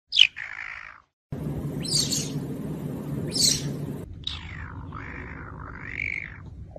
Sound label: Caracal